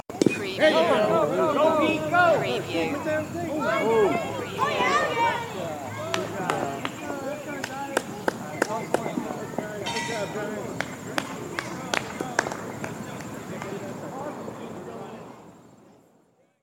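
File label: Little League Hit Clap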